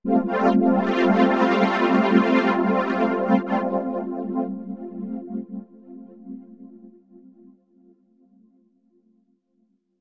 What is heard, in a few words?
Jitter String; AMG - Prototype Drum & Bass